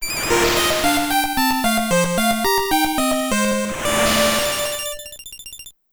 Item Acquisition Fanfare; Metroid II Return of Samus Remastered

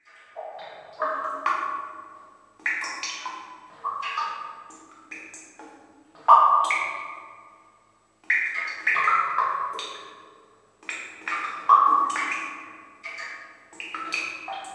Ambience Caves; Marlin's Cave

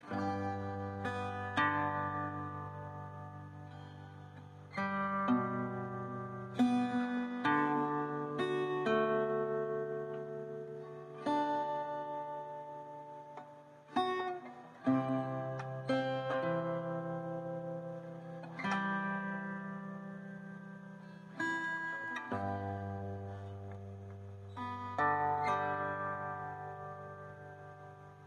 loop Neutral

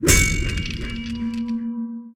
SPELLS Invulnerable

